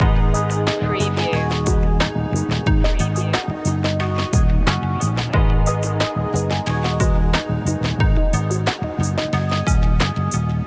falling stars loop; enchanting

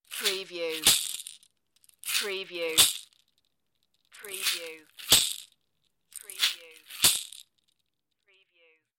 Caxixi; maraca

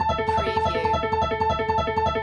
Casino Slot Machine Honkypiano Loop; lynne-publishing

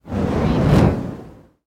Torch Single Pass By; Sound Effects Bible

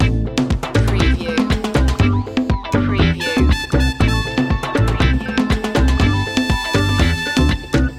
loop, latin spanish

